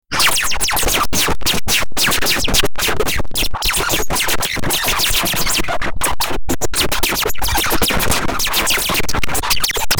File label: outro music thriller them, theme